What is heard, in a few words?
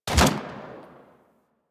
Fire Shot, Weapons; Braton MK One Redux; Warframe